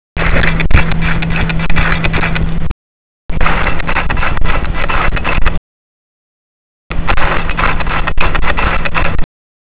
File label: liveview af, Nikon D90